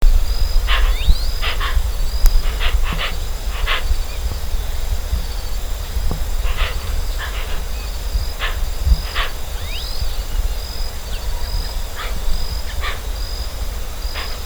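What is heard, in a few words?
Hoatzin, bird; گندمرغ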